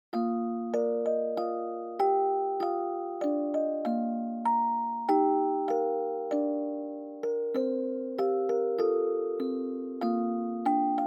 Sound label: Dry Fast